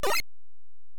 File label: mega buster